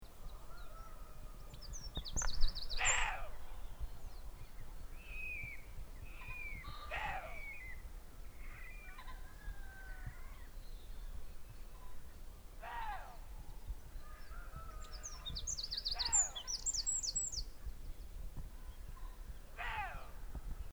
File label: Water deer